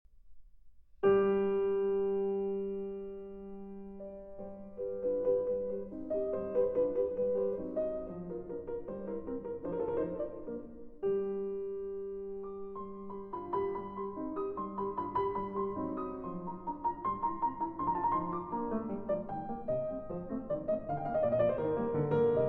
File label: Piano Sonata No. 21 in B-Flat Major, IV. Allegro ma non troppo